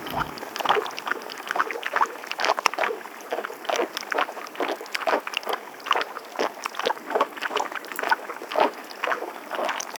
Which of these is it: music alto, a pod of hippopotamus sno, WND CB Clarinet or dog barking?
a pod of hippopotamus sno